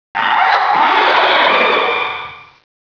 dead tree

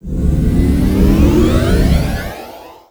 power on; trek

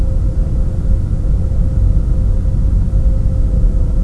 hum

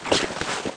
acquire

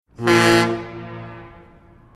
ferry horn

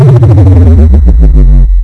cloak down

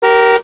horn

